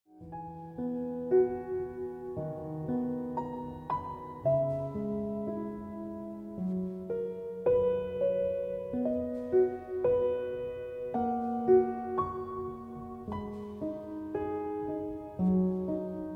Dont Leave Ringtone Piano Music

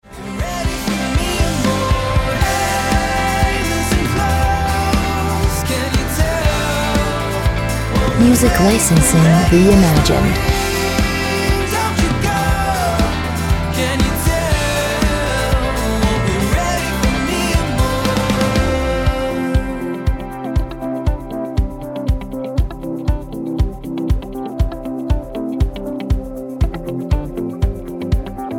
Falling Ringtone

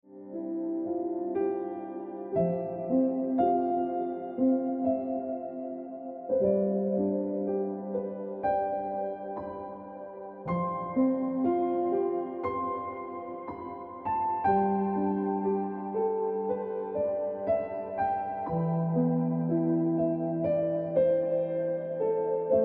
Ringtone Piano Music

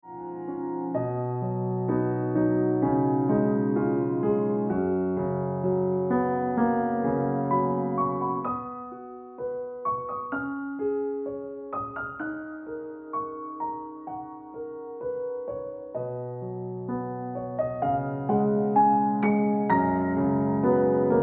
Exception Ringtone Piano Music

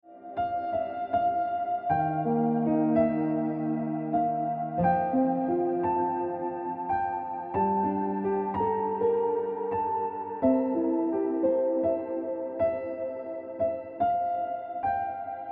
Ringtone Piano Music

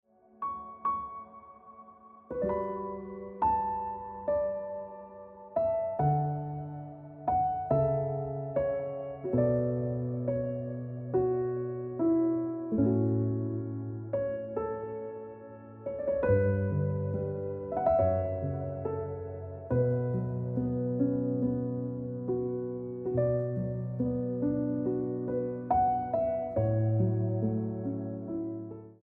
Piano Music

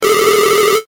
Ringer Bonjour Ringtone

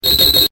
Notifier Cricket Ringtone

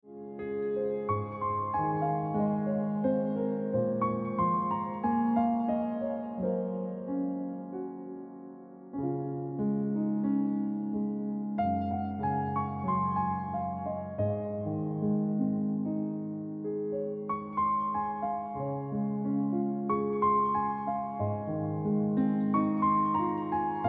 Ringtone Piano Music

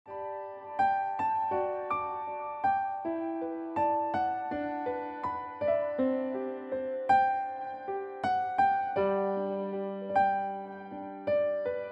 Ringtone

